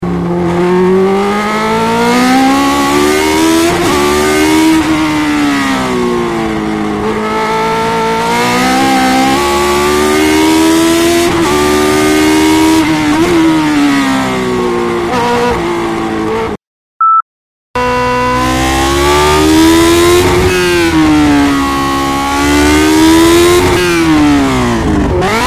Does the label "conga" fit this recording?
No